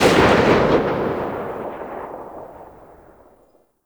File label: AK47 Tail; Tenno